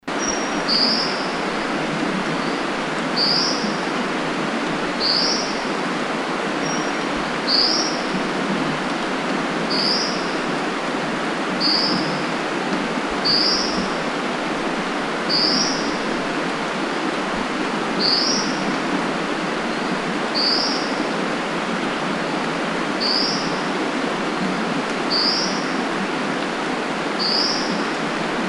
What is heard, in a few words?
Brown Dipper Ssp Pallasii Call Guangxi Da Yao Shan; cincle de pallas cinclus pallasii ssp pallasii